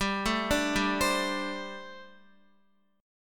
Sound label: G Suspended 2nd Suspended 4th G, Guitar, 4F, 4A, 5C, 5G, 5C, 6E; arpeggiated; x,10,9,7,7,8